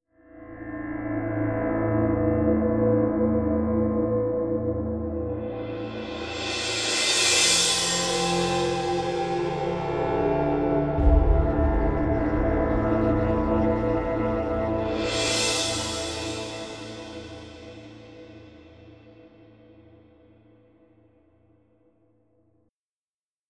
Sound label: beat with pad